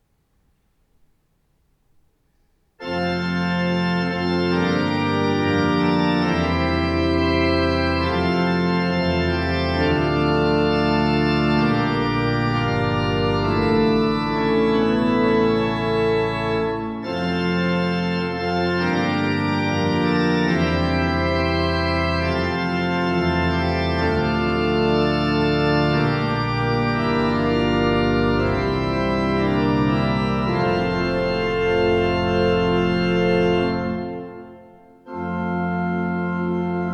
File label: Fanfare